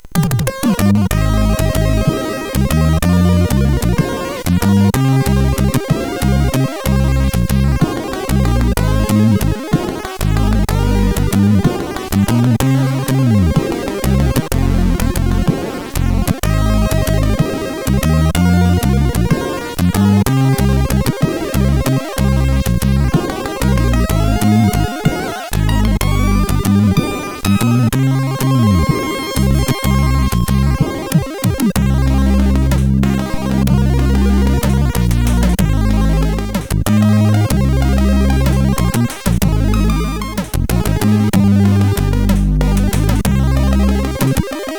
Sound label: Soft String, sid CSG8580R5; hvsc